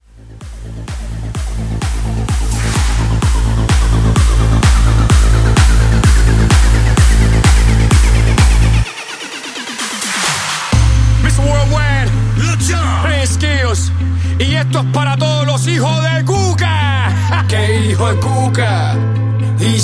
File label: Future House Mashup